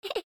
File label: Snowman Laugh; lethal company